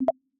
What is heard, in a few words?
Morse